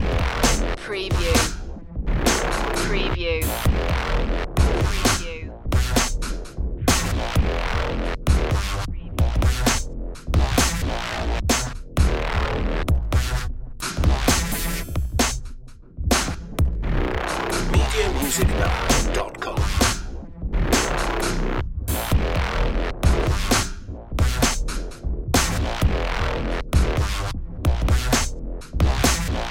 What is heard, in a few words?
offending item loop